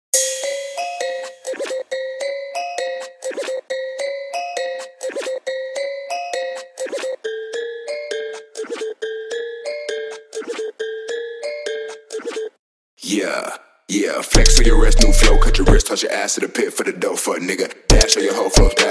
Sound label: 28Hz Low Bass